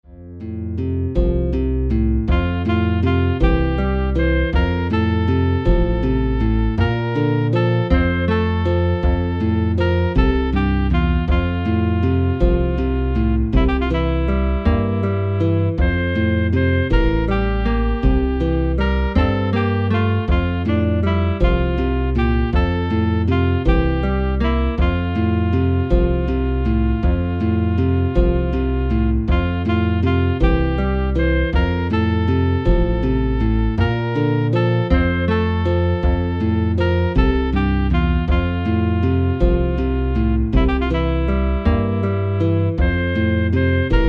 chitarra; canti madonna; processioni di li variceddi

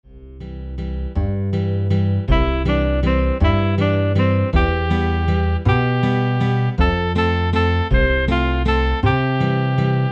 madunnuzza do ritu chitarra; canti madonna

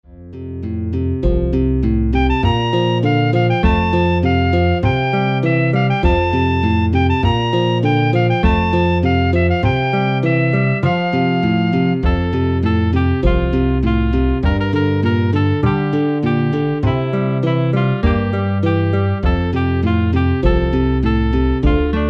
salvi rigina kore chitarra; canti madonna

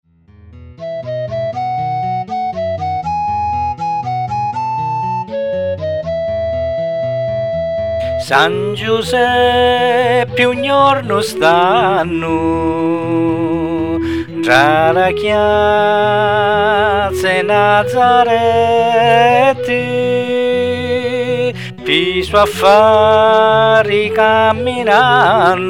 canto di natale canto musica; canzoni religiose; san giuseppi

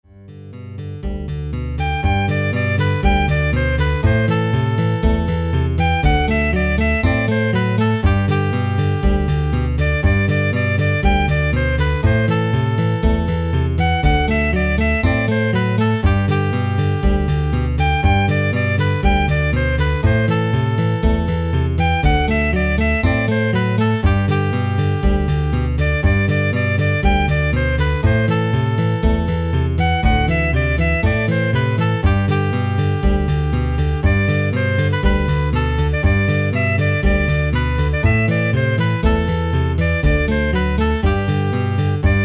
la bedda matri quannu chitarra; canti madonna

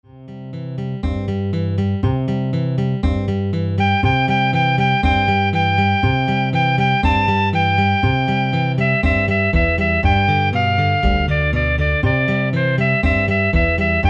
matri damuri chitarra; canti madonna

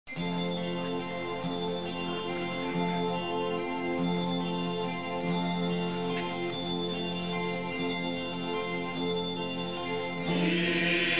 Processione di li Variceddi; canti madonna

